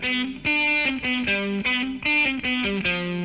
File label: guit